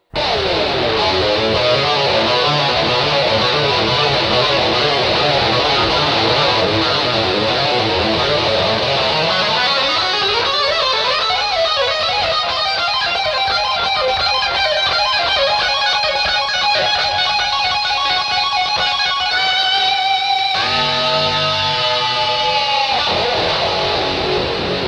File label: lick; brutal legend